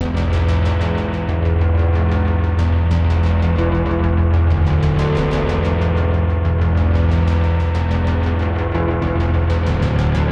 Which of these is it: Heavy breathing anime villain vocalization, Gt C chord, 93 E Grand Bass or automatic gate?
93 E Grand Bass